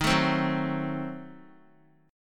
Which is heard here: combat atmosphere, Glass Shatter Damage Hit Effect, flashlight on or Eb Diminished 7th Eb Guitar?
Eb Diminished 7th Eb Guitar